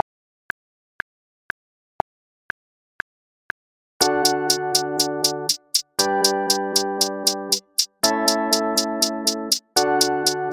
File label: 120 BPM Backing Track